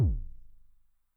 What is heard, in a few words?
BD MOD [Pitch+Depth+Overdrive], 909mod, BASS DRUM